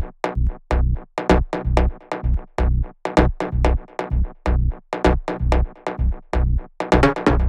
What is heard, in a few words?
128BPM Melody; Vengeance Samples; Jamboo Kit